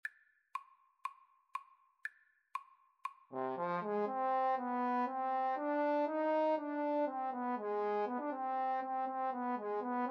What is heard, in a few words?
Odessa Bulgarish, Trombone Duet